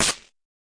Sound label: fx drum, Electro FX